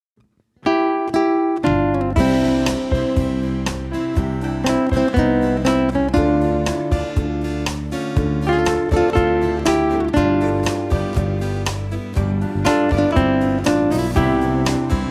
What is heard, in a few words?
solo guitar